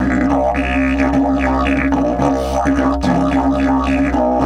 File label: 108, Didgeridoo